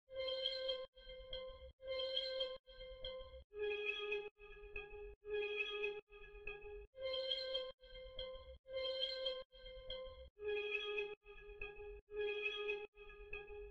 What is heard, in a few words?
140 BPM C Min Texture; Melodies; Loop Stems; Cymatics; 2022 Melody Mystery Expansion; No Loyalty